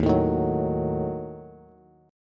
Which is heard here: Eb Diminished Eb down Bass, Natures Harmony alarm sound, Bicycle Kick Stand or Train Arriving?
Eb Diminished Eb down Bass